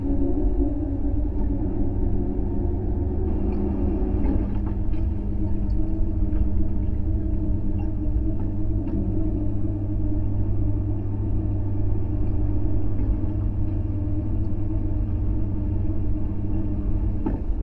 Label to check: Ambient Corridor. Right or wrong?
Right